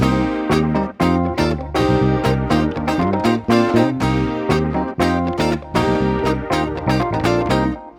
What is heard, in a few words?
Backing, Nu Disco, 120bpm Cm; Zero-G; Construction Kits; Legacy